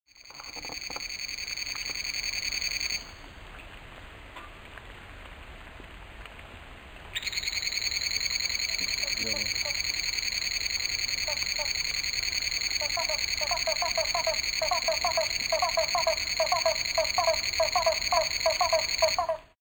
Chiasmocleis albopunctata

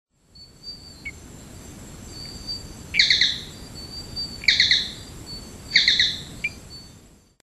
Gephyromantis pseudoasper

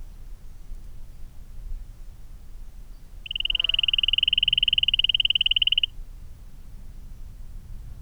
Leptodactylus oreomantis

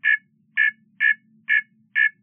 Xenopus poweri

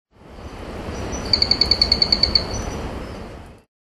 Gephyromantis blanci

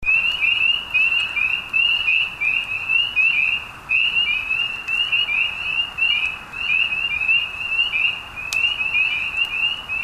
Pseudacris crucifer

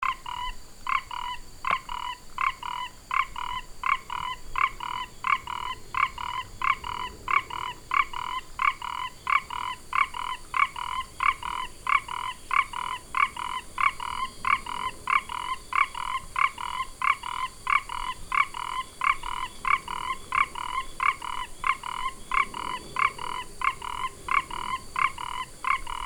Ingerophrynus quadriporcatus

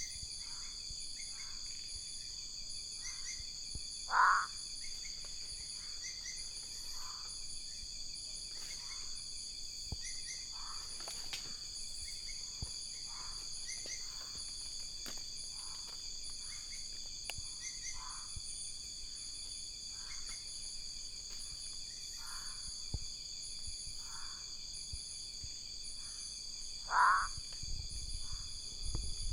Limnonectes limborgi

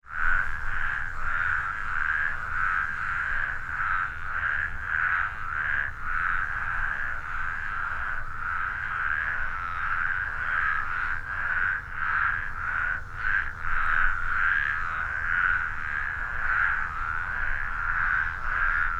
Spea intermontana

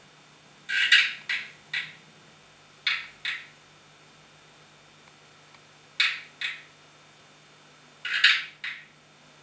Taruga eques

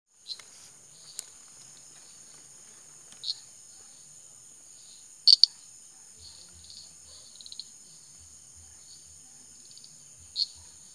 Pseudophilautus popularis

